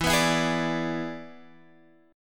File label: E 7th E down Guitar Drop D